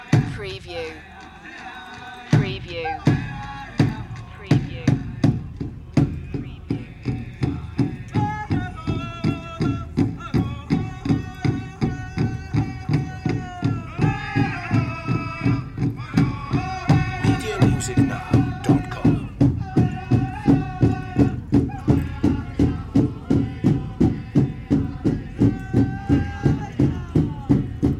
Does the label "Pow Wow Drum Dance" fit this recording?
Yes